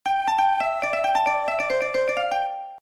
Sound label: big win